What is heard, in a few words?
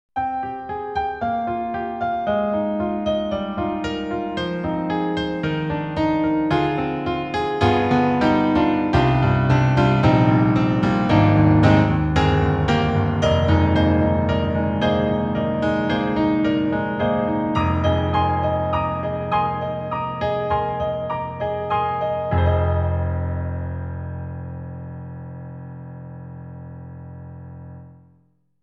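EDIROL SD-90, Solo, Stereo Piano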